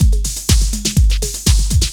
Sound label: Ensoniq ZR 76 Techno 124